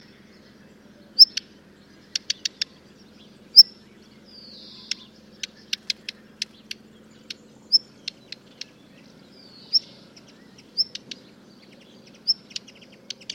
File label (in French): rougequeue noir